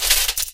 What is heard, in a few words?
cloth foley